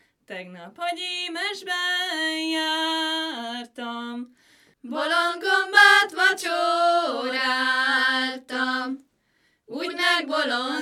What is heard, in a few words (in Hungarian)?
nepdal; tegnap a gyimesben jartam